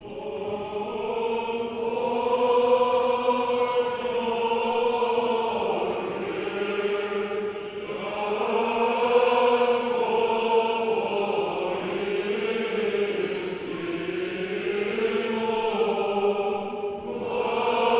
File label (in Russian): Хор Рогожской общины 4 глас; Достойно есть